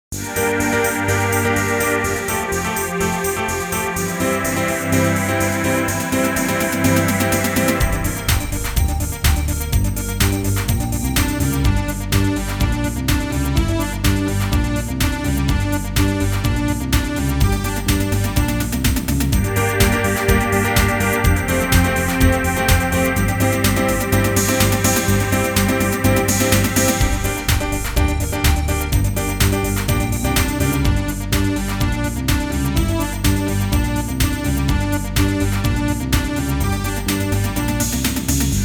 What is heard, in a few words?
i can jump karaoke